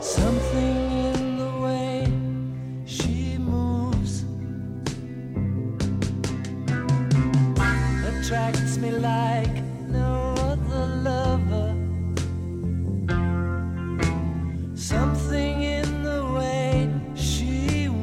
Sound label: leslie